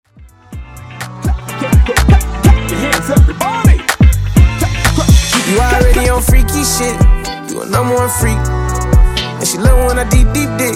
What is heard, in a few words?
Scratch Samples Break 125Bpm